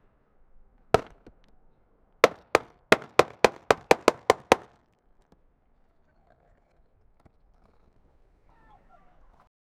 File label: heavy collision sound, hockey